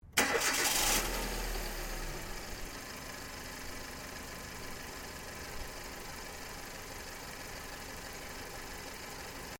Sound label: Startup Engine Bay, car; Hyundai Accent 2008; Hyundai hire car; Vehicles